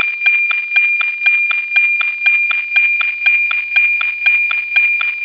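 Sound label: NOAA, satellite; Ham Radio 2000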